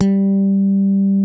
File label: DYNA MID G4, DIGITAL BASS; Music Store - Claudius Diemeter Schwerlos